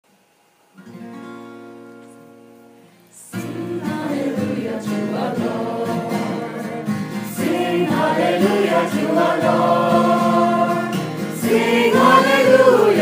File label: Sing Alleluiah; singalong